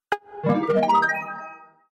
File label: fly